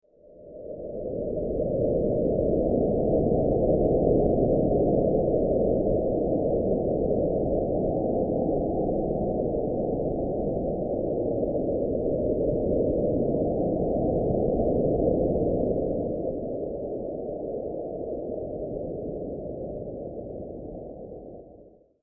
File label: Ambient Wind; horror sfx vol 1